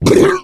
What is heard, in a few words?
male boomer pain short; voice; Left 4 Dead 2